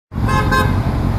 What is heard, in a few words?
horn